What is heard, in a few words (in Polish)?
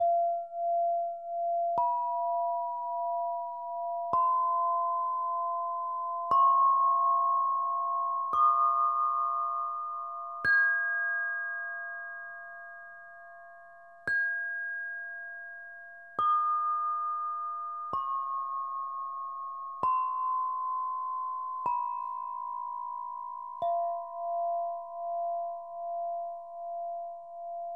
Secretive scale, harfa krysztalowa; Lekka harfa krysztalowa Secretive